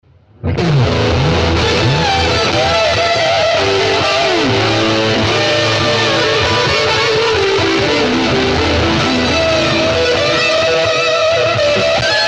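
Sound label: Dapper Indie Fuzz Chorus Reverse Plate; VALETON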